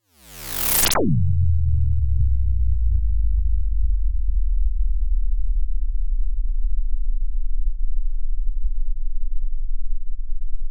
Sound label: impact